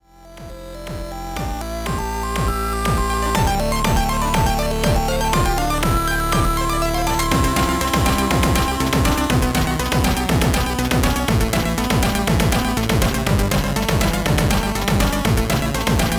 chip

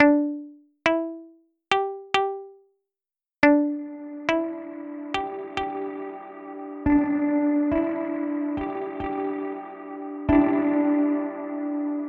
Spring Reverb Pedal Impulse; Remsky; Mosky; Impulse Demo

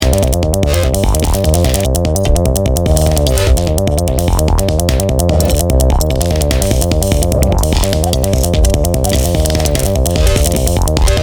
Glitch; Doofers; Thebellows

